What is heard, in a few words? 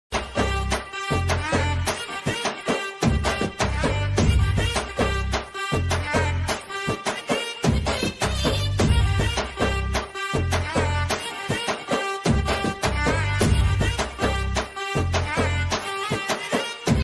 background music ringtone